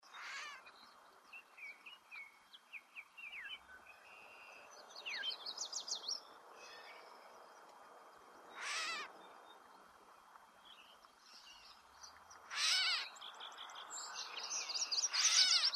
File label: Catbird Imitating Cat, catbirds